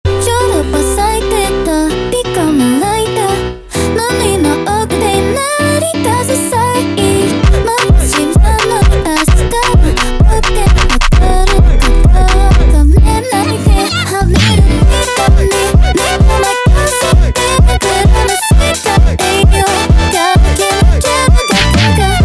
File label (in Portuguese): Montagem